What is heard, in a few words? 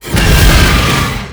DragonViolent; Kundun